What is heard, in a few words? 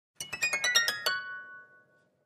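Harp Slow Short High Strings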